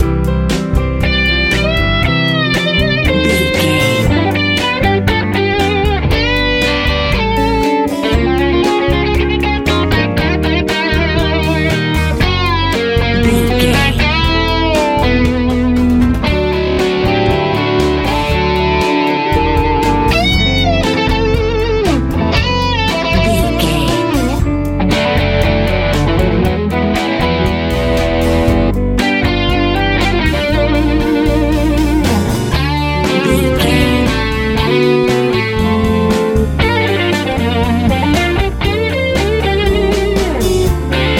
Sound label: crazy fast spinning bright love easy pop